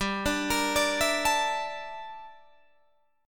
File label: G Minor 6th Add 9th G down Guitar Drop D